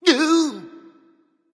surprised; Smite; cabrakan

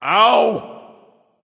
ouch; smite

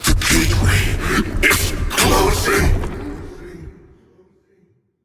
death; smite; sylvanus

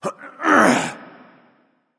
clear throat; Smite; baronsamedi

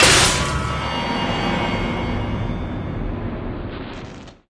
death break; smite; chronos

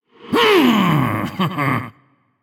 yay; smite; gilgamesh

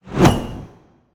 sickle swing; smite; osiris

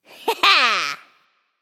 hehheh; smite; voicepack; scylla

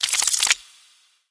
click; smite; chronos

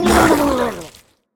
Banana Eat; smite; raijin

